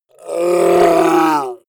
orc call pain long; kreaturen

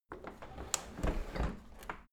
window handle close tilt soft; objekte; fenster

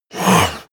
monster gasp aggressive; kreaturen

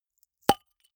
flip-top bottle open unseal; berufe

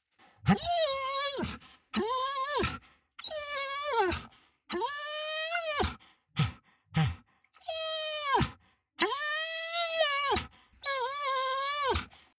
dog whine heavy; natur-tiere; hunde

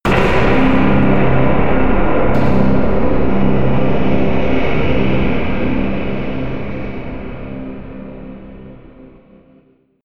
crush hit

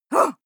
female shout exhale upset short; schreie; menschen

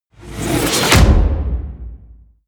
wham, whooshes

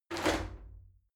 shower slide door open hit regular

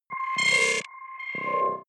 button, signale; soundpool

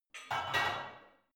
barbell only replace reverberant; sport freizeit; fitnessstudio

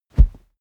whoosh cloth low soft; swooshes-swishes

